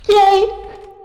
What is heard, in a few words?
yay